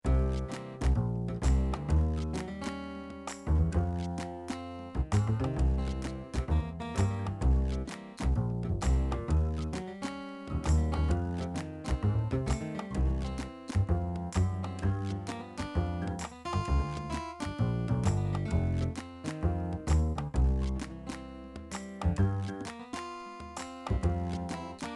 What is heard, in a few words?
Print Menu; Blue's Clues Kindergarten